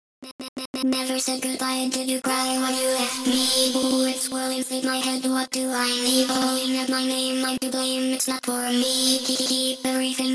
main vox

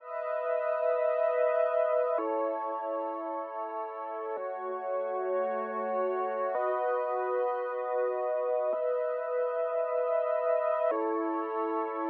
chords

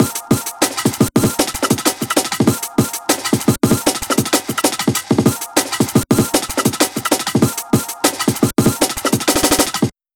break

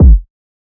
kick

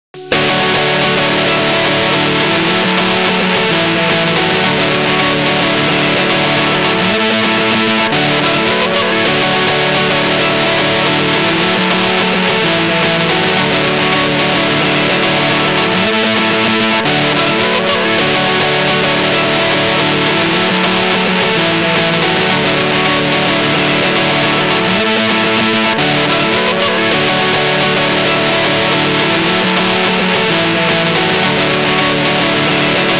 guitar chords

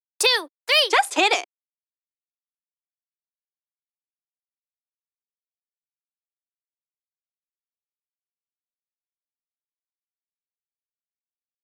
Bmin FX

